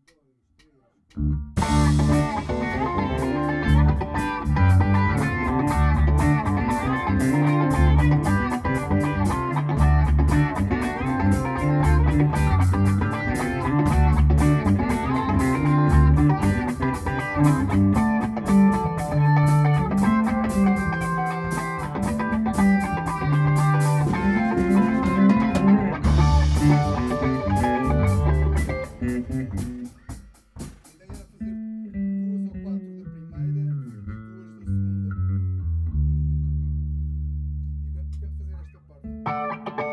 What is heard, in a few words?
Funky Part